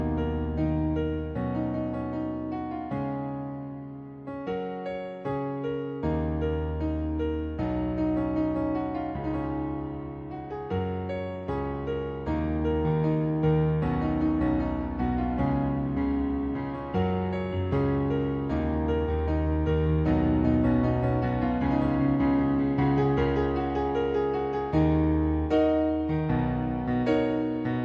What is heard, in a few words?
piano cover; Electronica